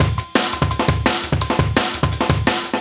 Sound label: Heavies Break, breakcore breaks